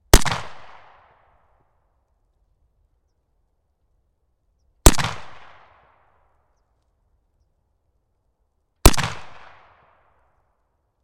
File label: AK47 big open area 2m above behind gun, Outdoor Gun Acoustics; Pole Position; RSM191; The Outdoor Gun Acoustics Library